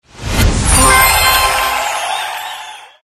puzzle make; frenzy vault

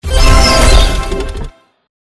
open chest; emeralds bank

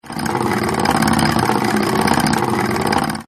reel scroll; wheel

